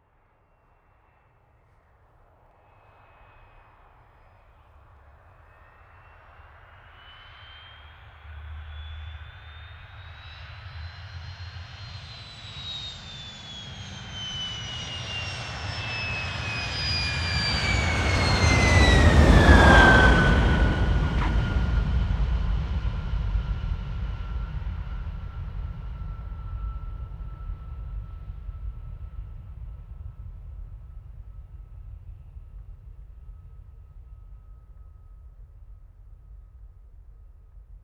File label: MiG15 UTI Ext Landing